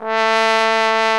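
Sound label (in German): POSAUNE VIBRATO; Best Service; Alpine Volksmusik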